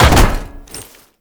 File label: Land Heavy Perforated Metal, movement; Warframe; Uriel